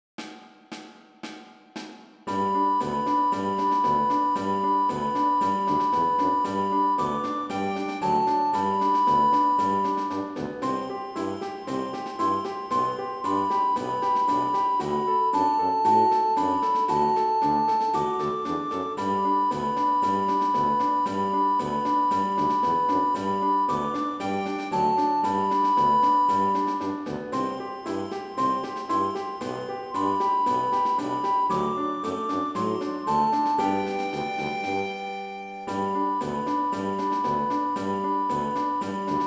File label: Jingle Bells C With Tuba